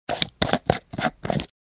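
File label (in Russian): lid unscrewed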